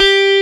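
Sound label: G3 Telecaster, guitar; AKAI S-Series CD-ROM Sound Library VOL-8; SET#1 GUITAR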